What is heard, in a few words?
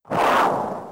Fall; RedDot